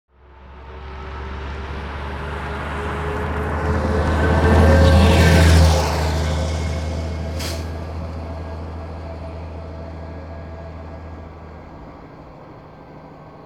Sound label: large coach bus drives by at medium speed; hollywoodedge